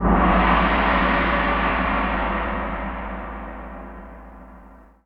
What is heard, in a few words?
Gong; darkenstein 3d